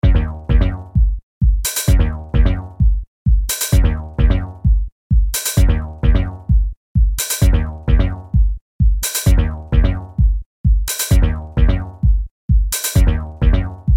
club beats ringtone

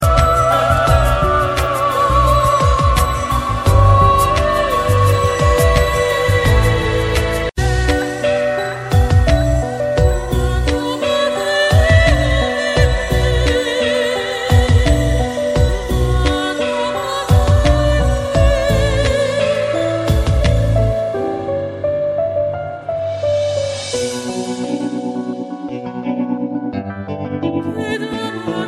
horror theme ringtone

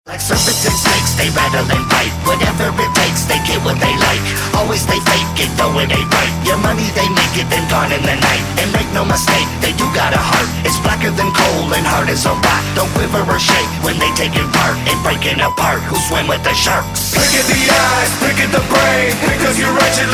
horror core ringtone

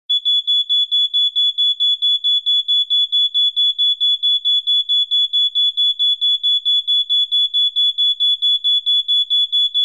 alarm ringtone

